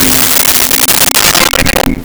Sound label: Celeste C4; Nintendo; July 2020 Gigaleak